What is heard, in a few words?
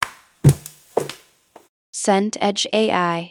footsteps boots wood